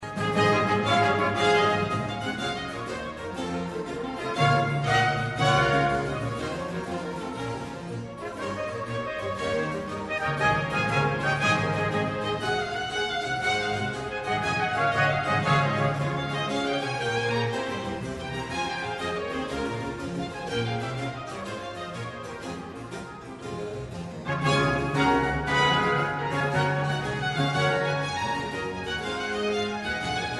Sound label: orchestral suite no 3 d major gigue; bach